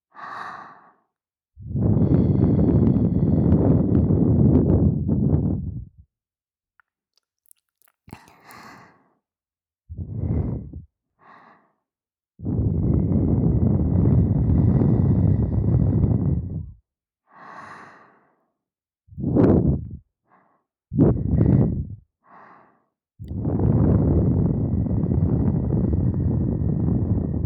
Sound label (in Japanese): ふーっ…ふーっ…, ASMR